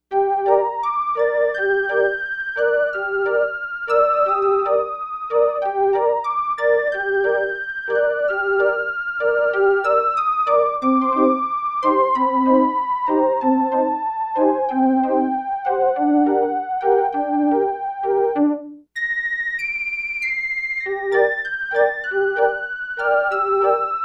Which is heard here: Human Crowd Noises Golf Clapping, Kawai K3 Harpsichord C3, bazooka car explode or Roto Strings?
Roto Strings